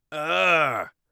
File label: amused, Warrior Voice; Warrior Voice Pack